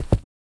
Fabric Floor Footsteps Shoes

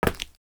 Leather Shoes Concrete

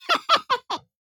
Hilarious Laughter Cartoon Chipmunk Voice

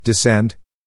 Male Descend Serious Voice

